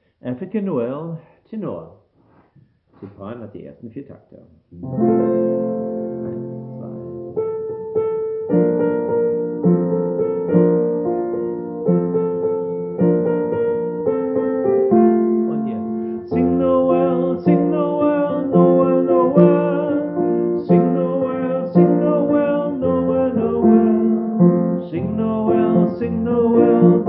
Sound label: African Noel Tenor